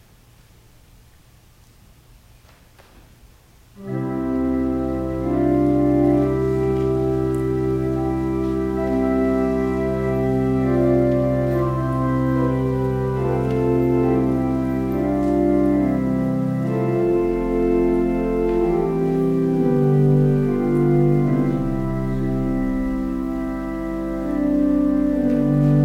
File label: Organ Music